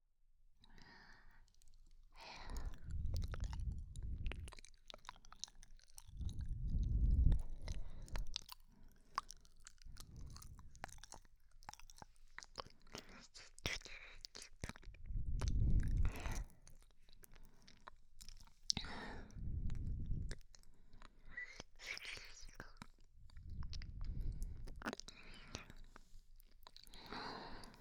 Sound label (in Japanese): ぺろぺろ 左